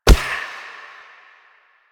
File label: Choir Bow Quick Fire; Warframe; Weapons